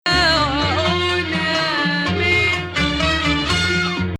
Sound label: Rast, maqam